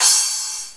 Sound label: Cymbal One Shot; Drums